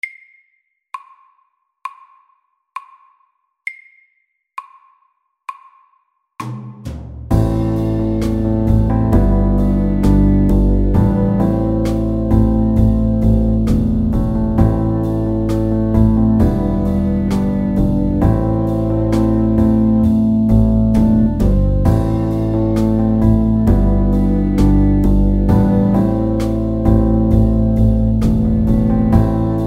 Pop ballad MIDDELS

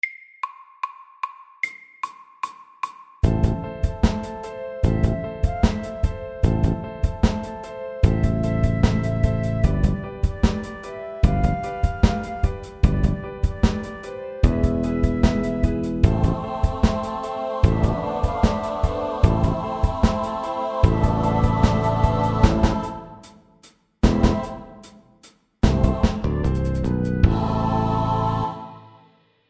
A minor rap FORT

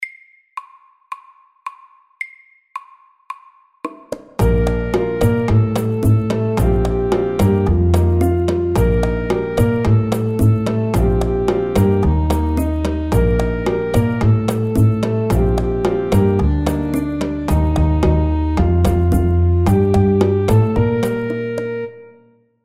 Spicy salsa MEDIUM 110

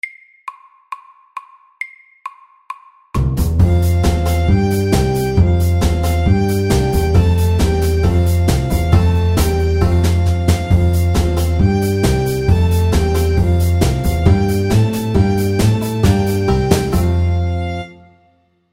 Square dance FORT

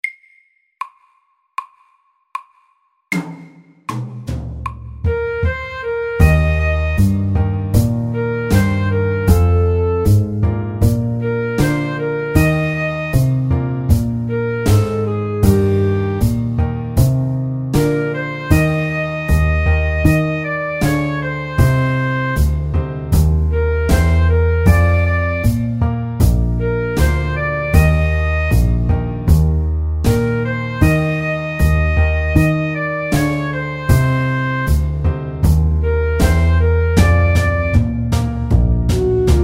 Slow blues fort

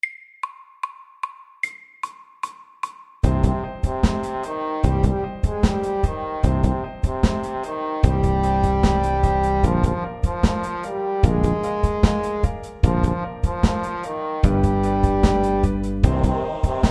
A minor rap FAST 150

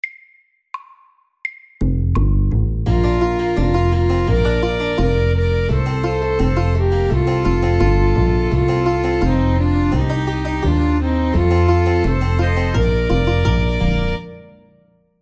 Country and western MIDDELS

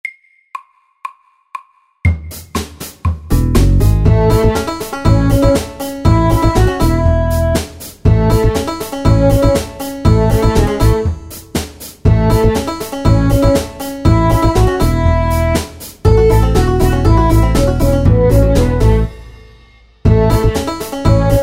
Bluegrass

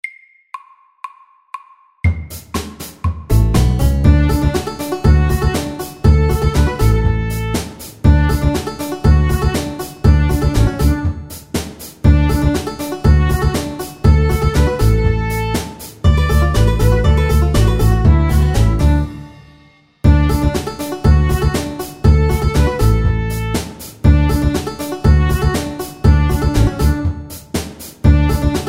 Bluegrass

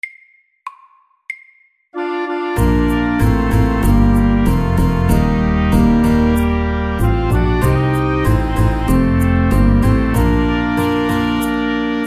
Mariachi band SLOW 95

